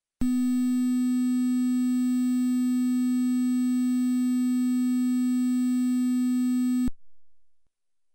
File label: sin Wave